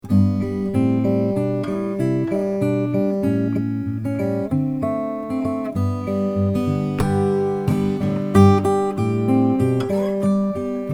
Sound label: mcknight mini mac ziricote